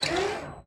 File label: factory boss jump